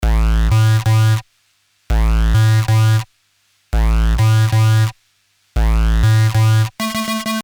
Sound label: mono disto, 127; Loop Mania Sampler Pack